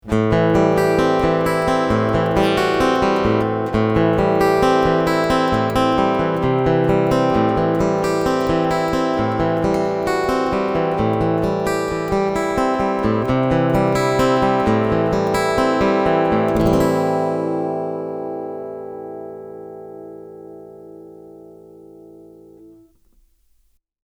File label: eq middle piezo